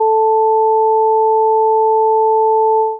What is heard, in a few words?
stereo pan